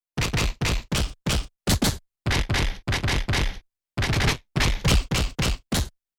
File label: punches